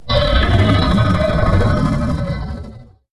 White Wyrm Roar

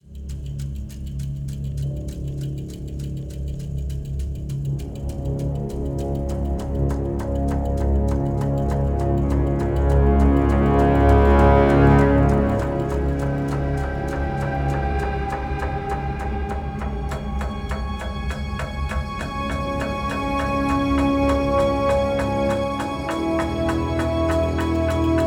Death Stinger

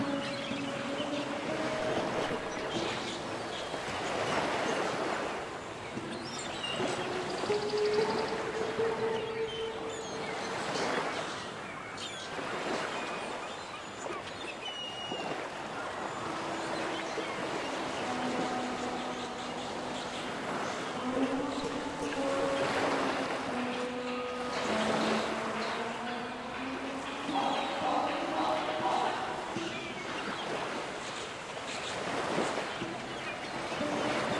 Tropigal Paradise Wave Ambience; Angry Birds Seasons

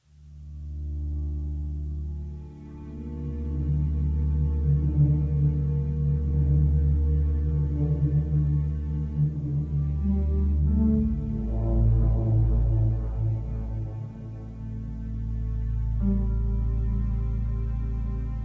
Catacombs Ambience; Hello Neighbor 2

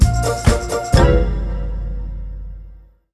Mission Failed! Jingle; the simpsons hit run; ps2; Kang and Kodos Strike Back - Jingle 2

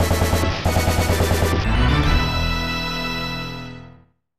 Pattern Clear; contra iii the alien wars snes

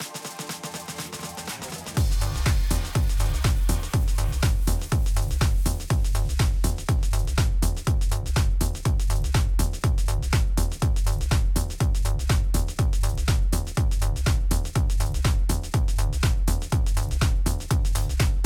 Ritual Groove; Tetris Effect

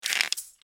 Shuffle

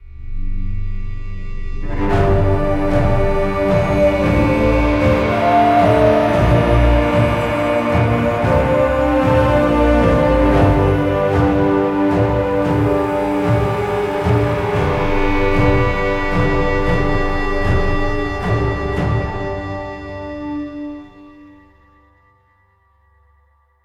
Victory; assassins creed origins unofficial soundtrack ps4 windows xbox one gamerip 2017; Victory 2 (High)

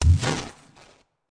enemy death

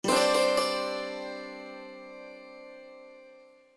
City Upgraded

